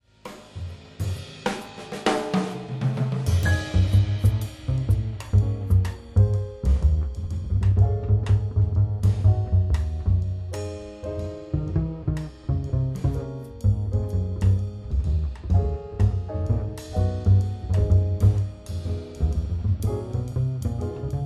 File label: hold music